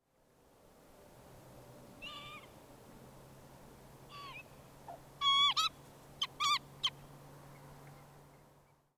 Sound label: Northern Lapwing Vanellus vanellus, Ladakh